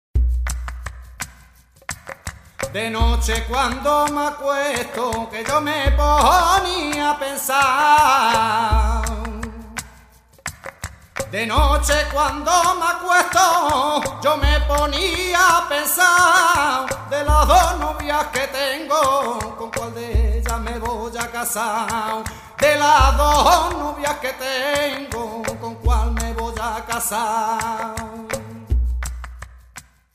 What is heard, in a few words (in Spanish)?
tangos